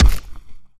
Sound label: Hit, Zombies, Attack; zps